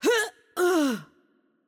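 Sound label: Arabic HurtHeavy; bloom minigame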